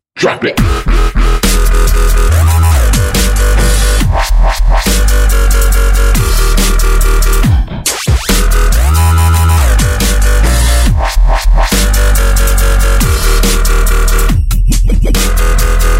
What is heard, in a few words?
dubstep drop it